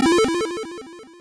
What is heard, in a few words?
ball hits hole, golf